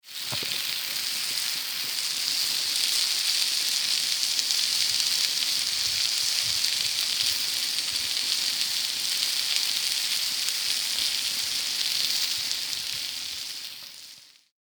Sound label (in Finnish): Ruoan paisto